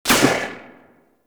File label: Pistol Shot; Warframe; Tenno; Tenno Bard Pistol